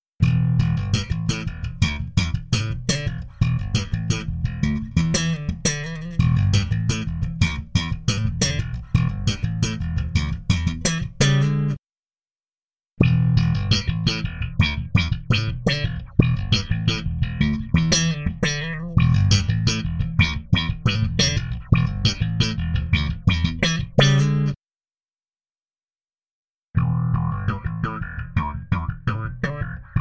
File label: electric bass